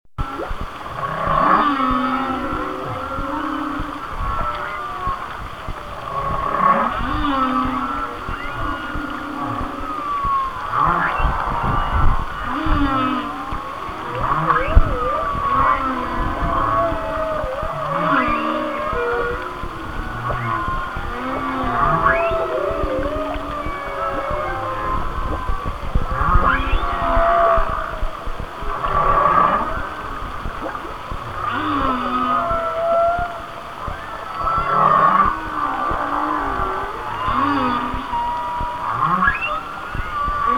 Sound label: Whale songs; Mike McDonald